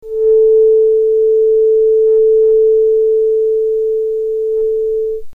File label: onsa A440